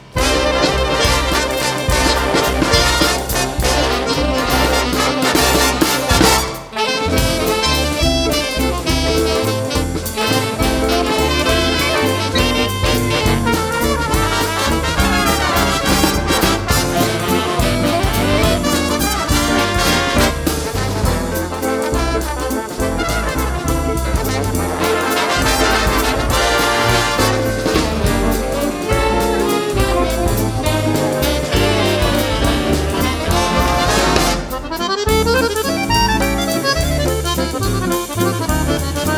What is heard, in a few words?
Swing valse